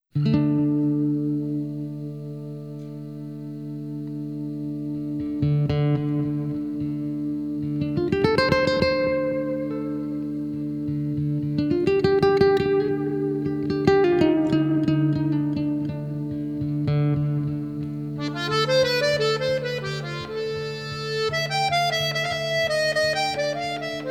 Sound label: Samba Blues